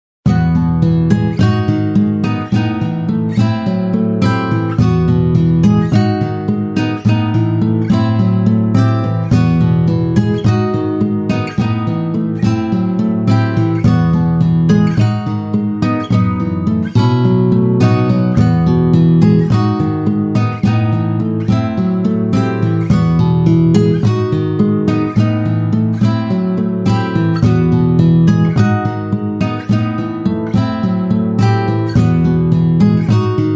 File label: bgm acoustic; maou; 脳の老化防止音楽; 魔王魂アコースティック4